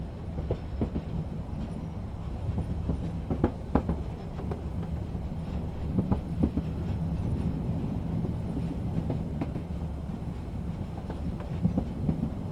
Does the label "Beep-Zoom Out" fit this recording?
No